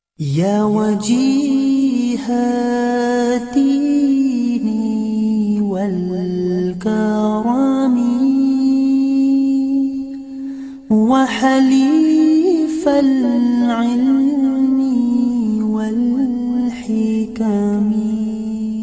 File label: Islamic Ring